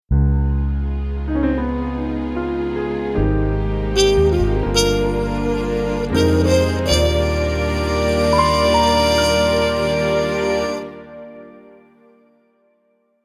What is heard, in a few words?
Muted Trumpet; New Age Notes Station ID